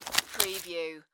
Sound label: Extension Cord Pick Up; Sound Effects Bible